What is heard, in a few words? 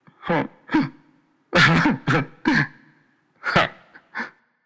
Boohoo; smite; voicepack; janus